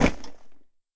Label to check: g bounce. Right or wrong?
Right